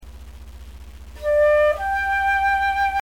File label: 2 notes